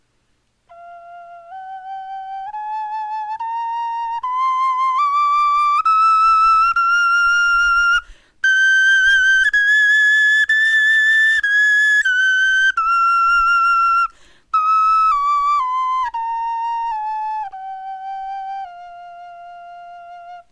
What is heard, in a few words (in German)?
tonleiter